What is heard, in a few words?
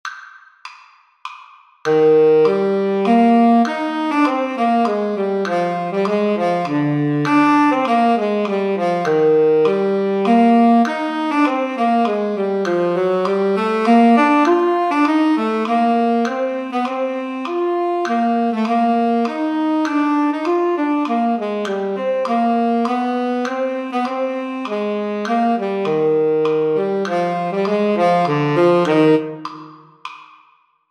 tenor sax set